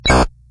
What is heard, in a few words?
ui no